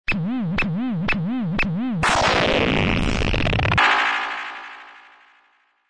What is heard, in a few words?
noise, sonnerie